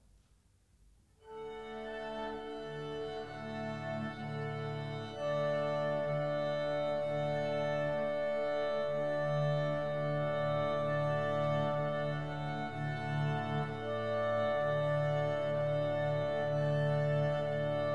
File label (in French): Clarinette Quintette; Leicester